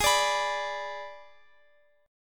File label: Ab Diminished Ab Guitar Open F; strummed; x,x,x,15,17,18